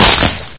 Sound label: headcrab head bite; mistake coop